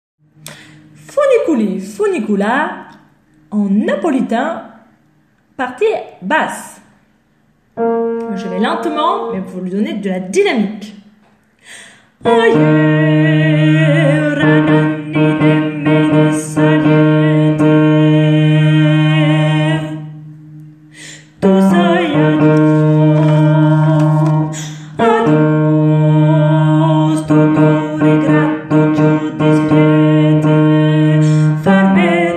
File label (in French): basses; Funiculi